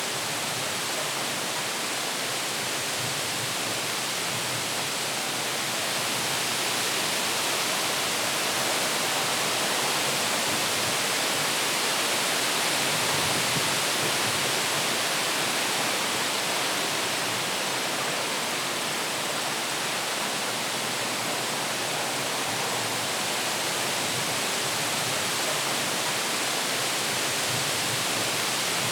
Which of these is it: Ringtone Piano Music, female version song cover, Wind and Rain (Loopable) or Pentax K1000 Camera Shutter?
Wind and Rain (Loopable)